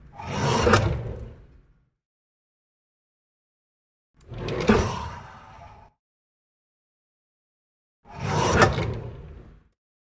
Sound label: Drawer Wood Open Close; drawers